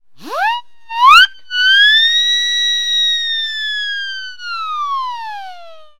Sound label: siren horn